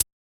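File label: Hi-Hat Closed; Yamaha DJX-2B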